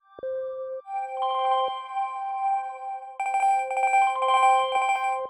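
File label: granular bell accent C major; bespoke - Floral v.2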